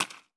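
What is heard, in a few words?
bullet case bounce 338 mud; gmod; viper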